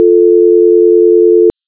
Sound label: conference dial tone